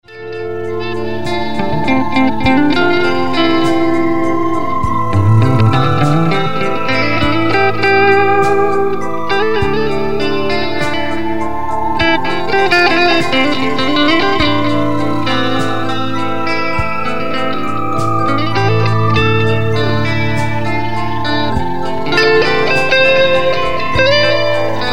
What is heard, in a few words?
guitar solo